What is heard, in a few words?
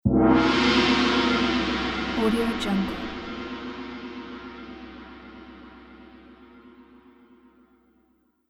big gong